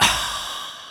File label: KORG Electribe SX VOX